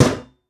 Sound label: FX Snare